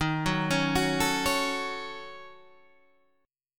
D sharp Minor 7th Flat 5th D sharp down Guitar Standard; arpeggiated